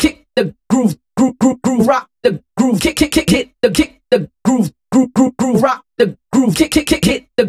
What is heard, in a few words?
House Loops, Vocal Loops House 128BPM; Vengeance Samples